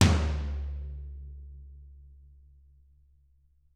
TT, Toms; Bob Clearmountain Drums I

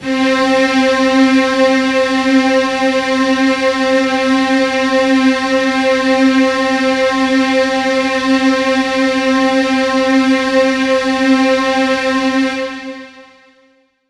C3, Synth Line; 90 s Sample CDs; NiceBeats; SynthLine VOL-15

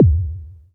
Kicks, Elektron MachineDrum; SPS1 MKII

